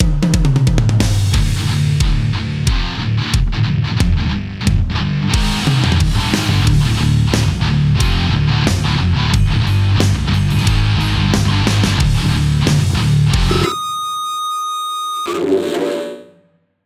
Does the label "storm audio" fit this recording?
No